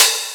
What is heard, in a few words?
dampened open hat one shot a sharp key